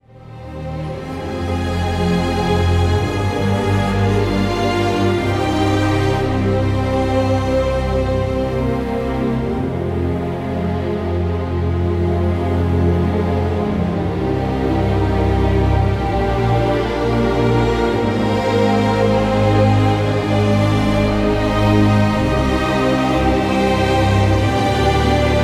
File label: Underscore Ominous, scoring; Scoring Reel